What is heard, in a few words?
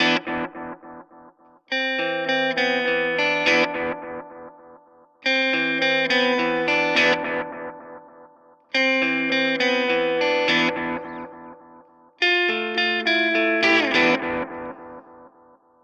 Nux MG 30 Delay Analog